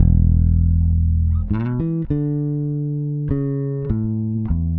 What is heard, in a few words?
Electric Bass; Basses